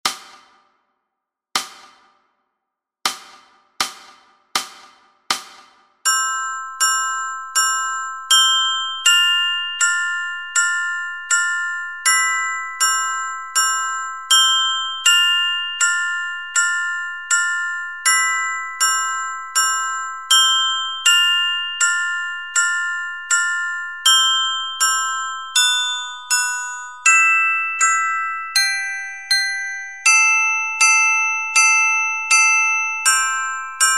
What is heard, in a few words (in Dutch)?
klokkenspel